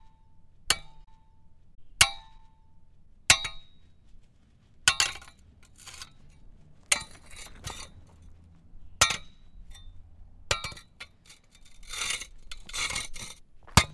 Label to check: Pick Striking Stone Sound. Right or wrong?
Right